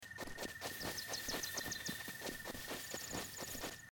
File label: running grass; Zelda - Breath of the Wild